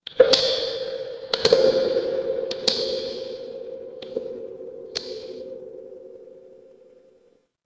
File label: horror ambience dark